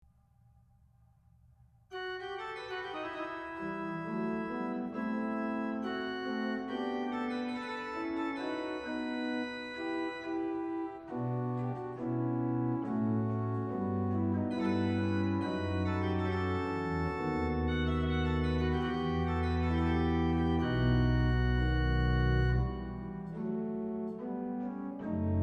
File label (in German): Choral; Hanff; Auf meinen lieben Gott